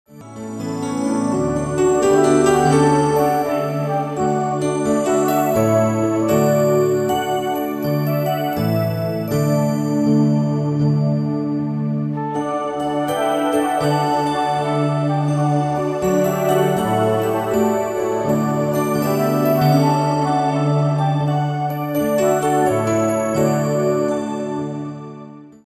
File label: Instrumental I Heard 3 Angels; Christmas